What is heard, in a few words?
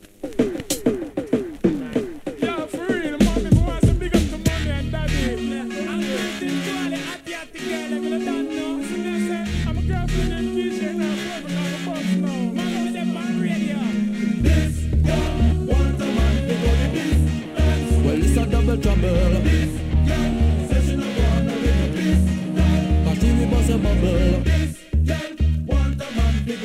arp